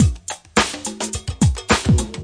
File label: freaky wiggly, drum loops and breaks, 107 bpm; 101 to 110 bpm